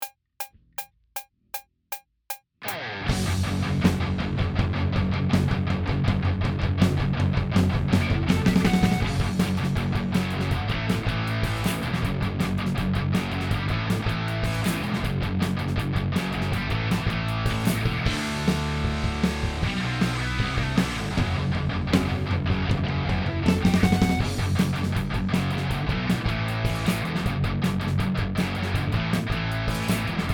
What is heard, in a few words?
158 bpm; Pre Pro